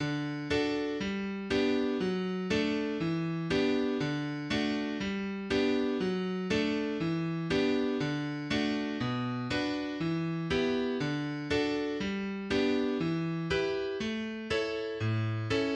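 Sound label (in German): O du fröhliche - Klavier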